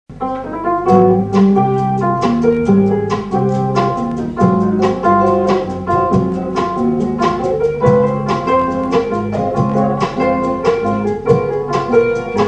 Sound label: bossanova